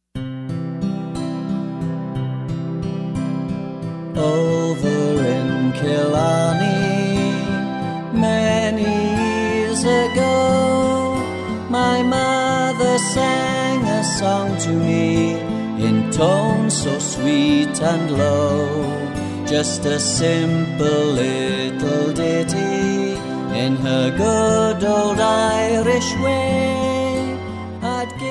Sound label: Irish Lullaby